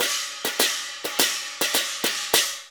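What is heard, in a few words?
CHINA, cymbal; Spectrasonics; Heart of Asia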